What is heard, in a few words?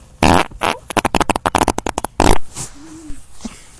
dragster